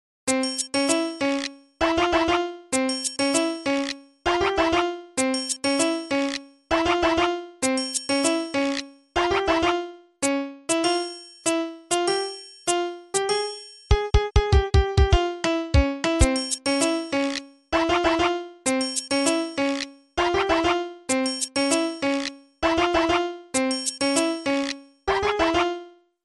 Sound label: arabian disco ringtone